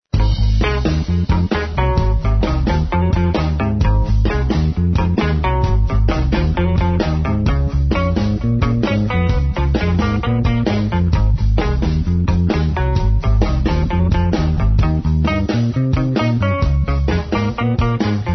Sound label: bg free